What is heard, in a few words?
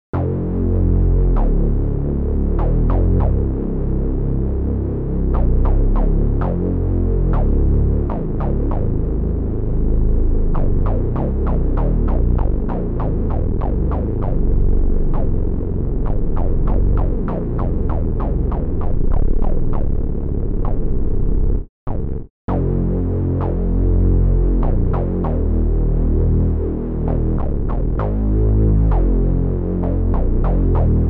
Fusion Bass; Volt